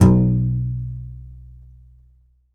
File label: C. Bass Balalaika, Plucked Strings